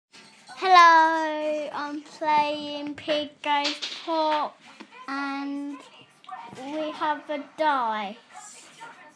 pig goes pop

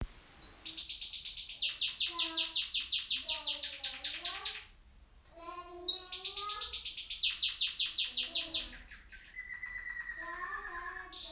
birds

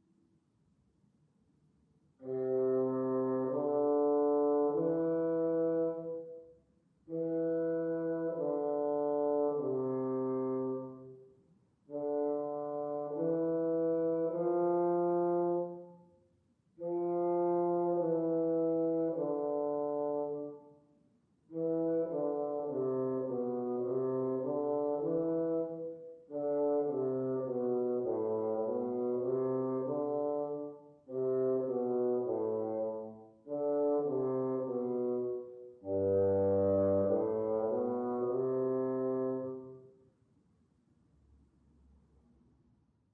bassoon studies; julius weissenborn

